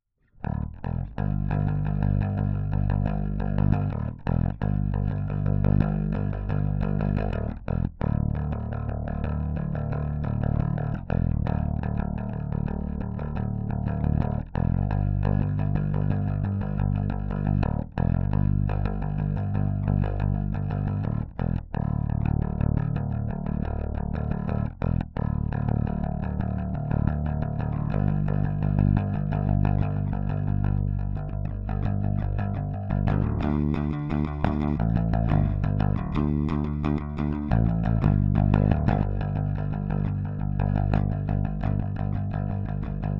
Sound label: bass; Trax4DrumMachine